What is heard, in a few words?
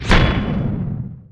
Rocket Launcher Fire; Playable Tutorial Level